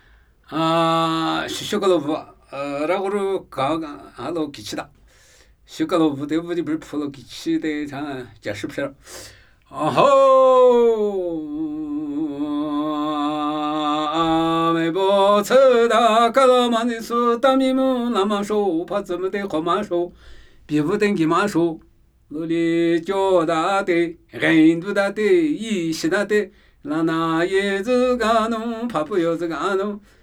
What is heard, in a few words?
Namuyi Tibetan Ritual Chanting